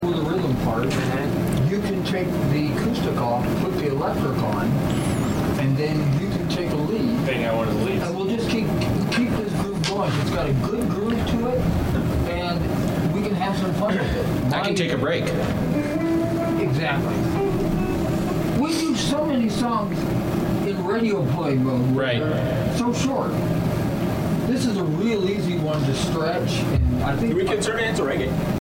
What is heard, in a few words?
Background Chatter